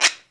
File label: FAST, GUIRO; AKAI S-Series CD-ROM Sound Library VOL-5